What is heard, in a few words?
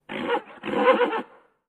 Horses Whinnies Snor